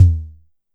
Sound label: Drum X Tom, Pearl